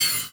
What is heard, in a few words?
shell out; cstrike; valorant; neofrontier sheriff